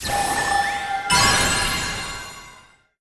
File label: Starbox Open Rare